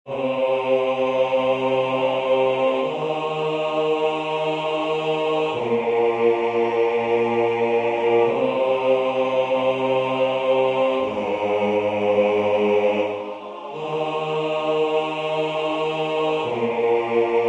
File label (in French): Regardez l'humilité de Dieu basse